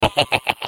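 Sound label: defect laugh, French; Defective Turret; sp sabotage factory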